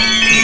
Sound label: windchime; Sound Effects for Multimedia - Volume 1